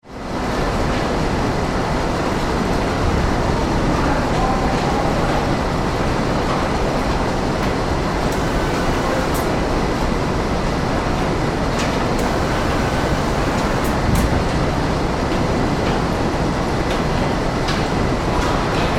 Factory Ambience, Industry, Factory, Ambience, Power, Train, Conveyor, Belt, General, Motors, Assembly, Plant